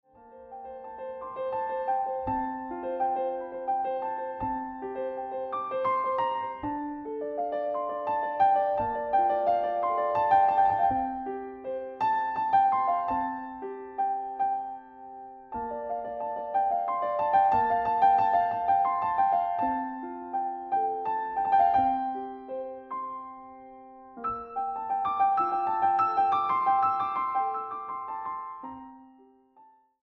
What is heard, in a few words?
Piano Improvisations Live; Live at Bernies